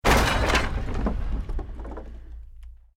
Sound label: EXPLOSION MEDIUM WOOD